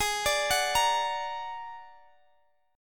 Bb Augmented 7th Ab, chord

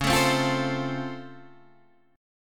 Db Augmented Major 7th Db down Guitar DADGAD

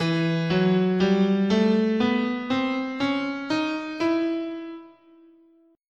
E Bebop Melodic Minor, scale

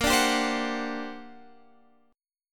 G Minor Major 11th, A sharp

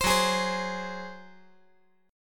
Gb Suspended 2nd Flat 5th 5G,4C,5E,5A

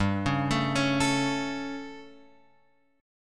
G Suspended 2nd Flat 5th G Guitar Open F; Gsus2b5 arpeggiated; JGuitar

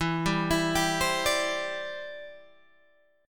E Minor 7th Sharp 5th, down, Guitar, Open G

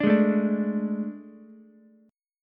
A Major 7th Suspended 4th, Ukulele; AM7sus4; strummed; JGuitar

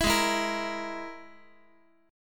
G sharp Suspended 2nd Sharp 5th, Guitar; G#sus2#5; strummed; JGuitar

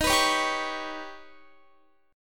D sharp Augmented 7th D sharp Guitar; D#+7 strummed; x,18,21,18,19,20

